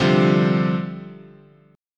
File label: C Suspended 2nd Suspended 4th, guitar chord